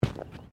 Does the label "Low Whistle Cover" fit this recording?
No